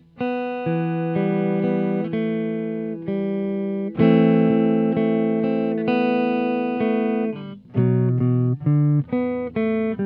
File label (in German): clean hals